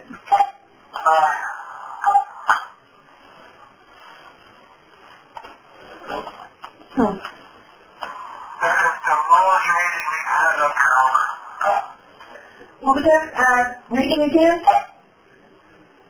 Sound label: piano room footsteps temperature